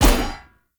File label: Sphere Polearm Medium Impact Armour Solid; Weapon Impacts; Player; Combat